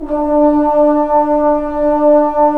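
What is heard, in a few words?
brass trombones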